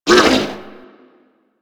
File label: Struggle; Warframe; Amalgam Override; Abilities